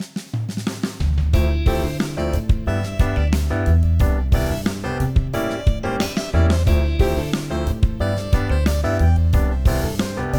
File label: Good Groove, ringtones; Samsung; Galaxy A56